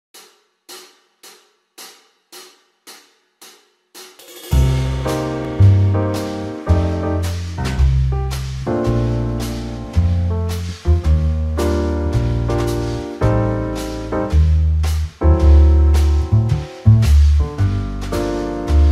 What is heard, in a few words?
Backing Track BPM 110 Gm; Gm - Piano; MyVirtualBand – Jazz